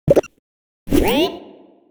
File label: Grab Throw Player; deep dive repair game design